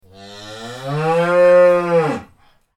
cow moo sound

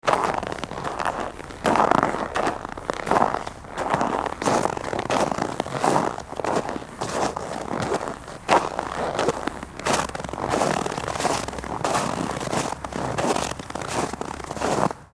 walking on icy snow sound effect

